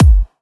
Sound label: crispy bass drum sample g key